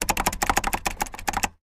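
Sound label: computer keystrokes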